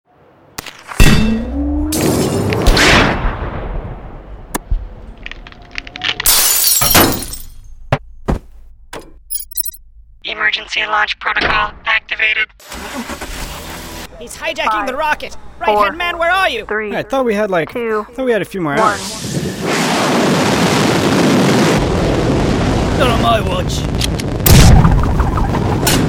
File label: Hammer